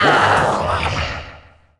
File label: die girl